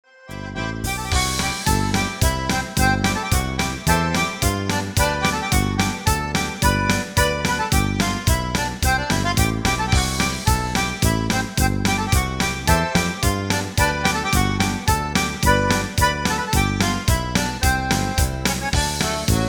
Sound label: beer polka